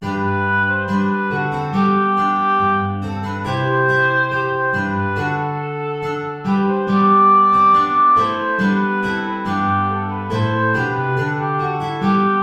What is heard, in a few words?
ballet; praetorius michael